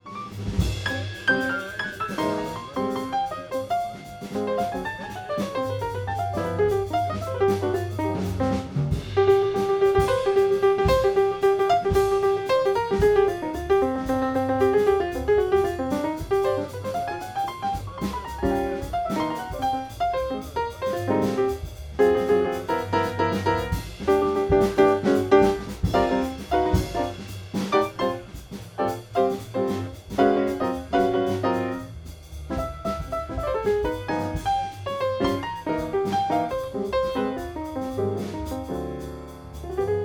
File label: Piano